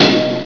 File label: Trap Spikes; Mythos